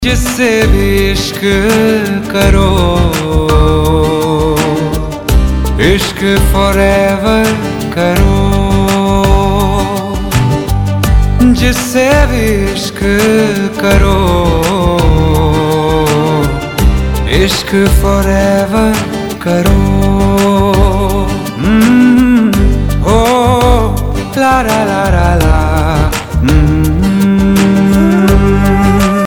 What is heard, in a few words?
male